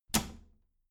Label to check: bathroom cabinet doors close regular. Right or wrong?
Right